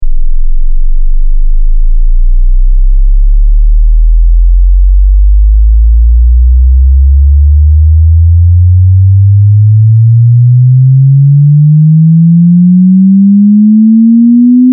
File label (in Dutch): 20-500hz exponentieel; testsignalen